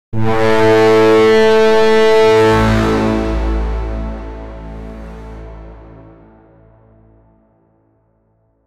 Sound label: warhorn roman empire horn